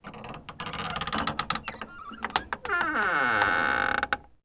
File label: door wood old creak; WAR